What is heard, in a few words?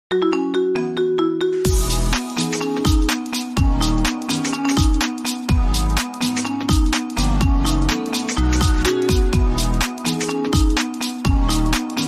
iphone 15 marimba, ringetone